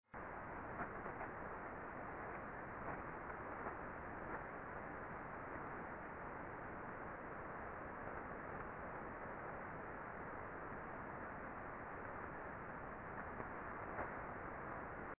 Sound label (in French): decharges partielles apres nettoyage